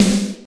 snare single hit g key, Royality free snare sound tuned to the G note. Loudest frequency: 1737Hz; snare drum samples; single hits one shots